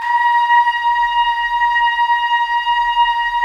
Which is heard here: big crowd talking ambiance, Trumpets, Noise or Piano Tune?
Trumpets